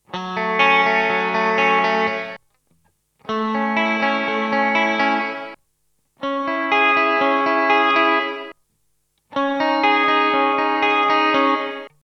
Gate; Boss RV-200

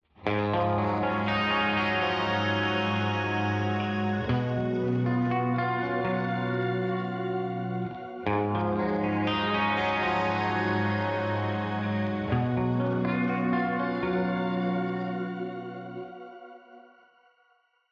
Riff LA 2A

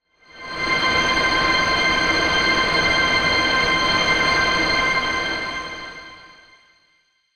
Granular Engines High Density and Jitter; SonuScore Nordic Spheres

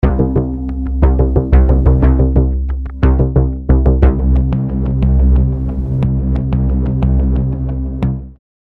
Rhythmic Pedals TRP Low; Gravity 2 Menu

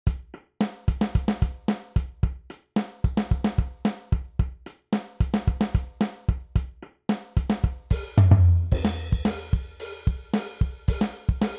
Yamaha FGDP-50

